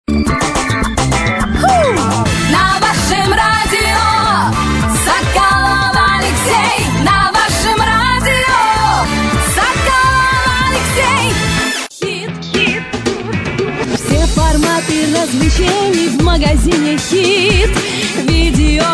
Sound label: voice song